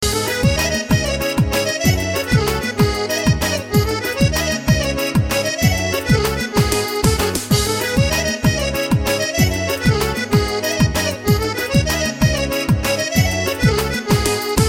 your phone ringing

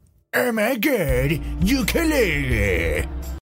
OMG ukelele

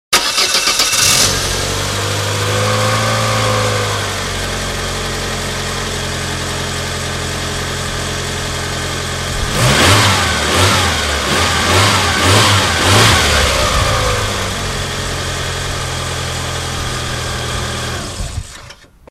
Opel Corsa LS

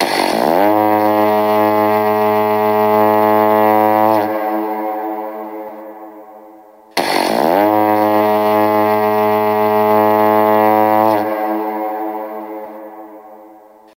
Tibetan Monk

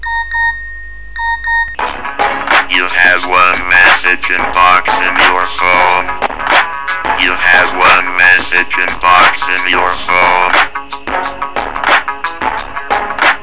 sms remix

